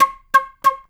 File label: Natural Flute FX, Jazz Solos and Sections, Traditional; Sonic Foundry (Sony Creative Software)